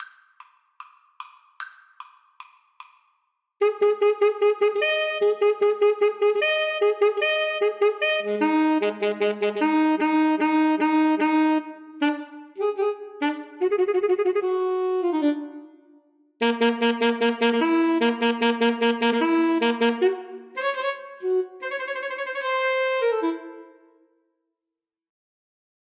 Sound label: poules A SAX duet